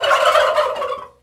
turkey; Animals

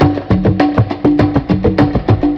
bonga line, Drum loops

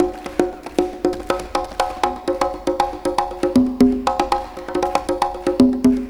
pitter patter, Drum loops

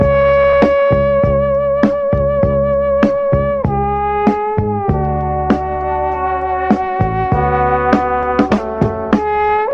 full horns, Jazzmatazz loops

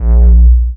blob, kicks heartbeats

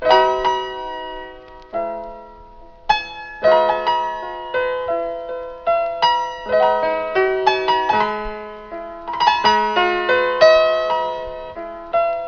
piano, Loops, Sad

